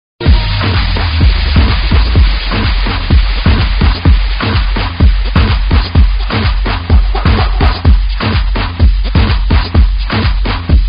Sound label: funk hiphop